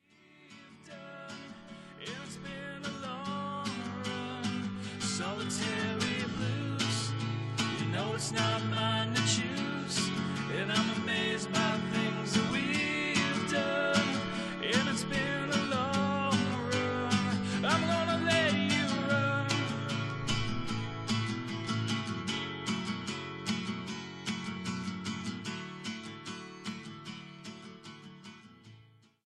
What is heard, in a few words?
Blues